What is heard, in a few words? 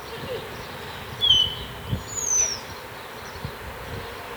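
mystery bird call, Heard in the city, in the afternoon. It was a Starling.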